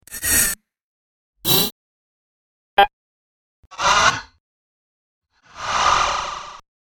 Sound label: 5 Sounds Production Elements, Electronic; Miscellaneous Electronic Sound Effects; Andrew Fleming